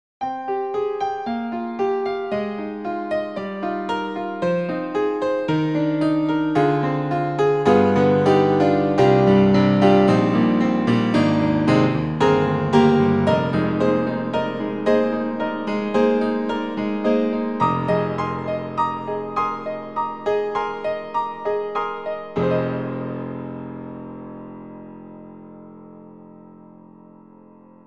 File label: HALion Sonic Filter Flex Piano; Steinberg